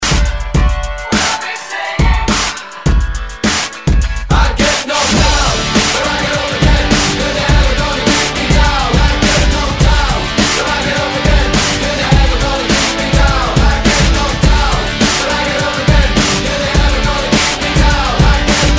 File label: 90s Re Drum 104 Bpm; DJDOLLS